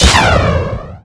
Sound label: crit received; video games; source engine; tf